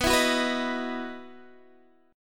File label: E Major 7th Suspended 2nd